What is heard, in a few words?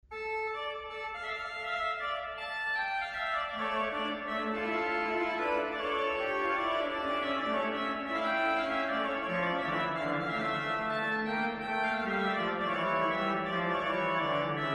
Duo, orgelbau; Grigny; Ave maris stella